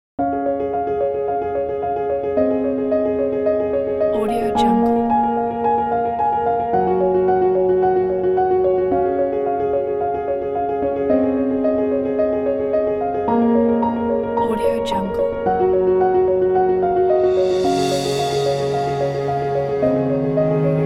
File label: positive emotional piano cello and orchestra